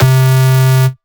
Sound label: Synths Root C